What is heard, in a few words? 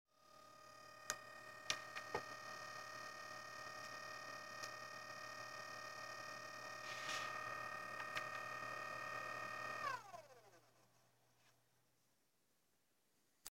vocal